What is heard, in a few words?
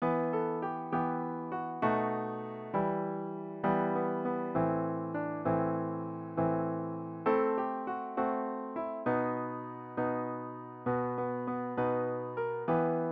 midi